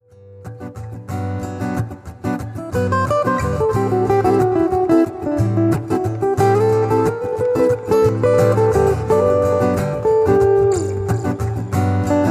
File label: Dinner Jazz live, acoustic guitar